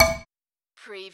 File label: Button Sound - Metal Tin Ding